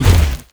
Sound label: gargoyle attack; Crypt of the NecroDancer